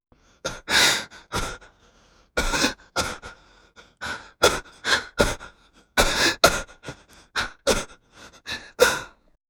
young man having struggle

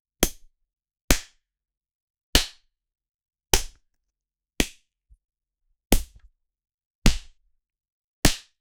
slap, humor; bud spencer

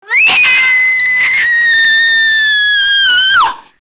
screaming woman; distress

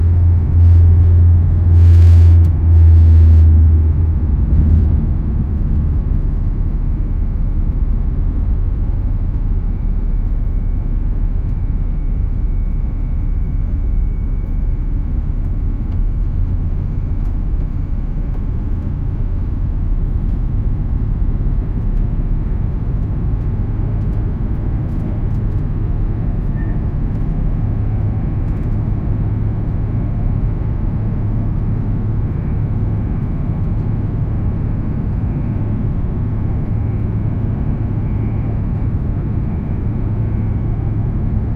boeing 737 800 window, airplane

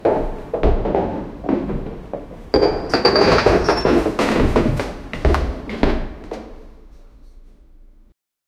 minions falling from the, cartoons

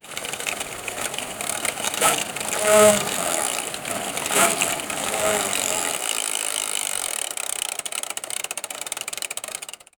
mouse drives toy car

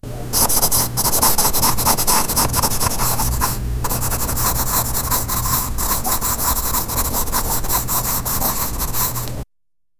paint a line very very, brush stroke

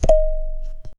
a clean dry audio recordi, musical instrument

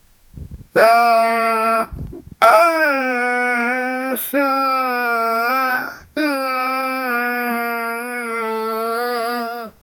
sad boy

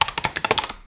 chess game started board

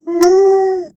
deep icy wolf howl frost

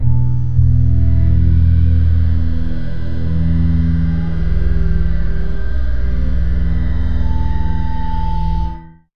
serious intense, documentary

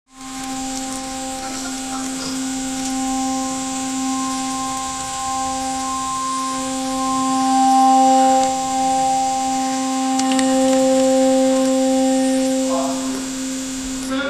a tree is cut with

